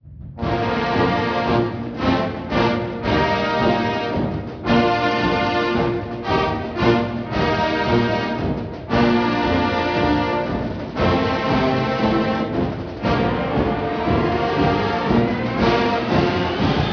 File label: march grandioso